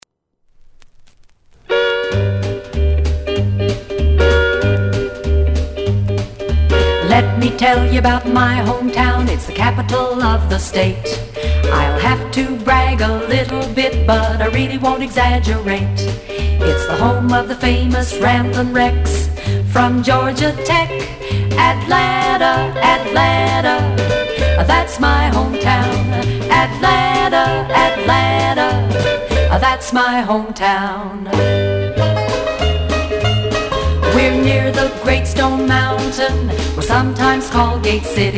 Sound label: radio jingle; WPLO